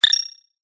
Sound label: button